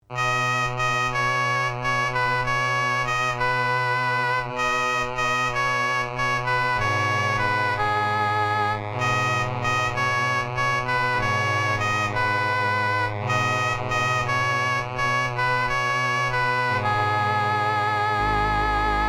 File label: PPG Wave Computer 360 Woodwind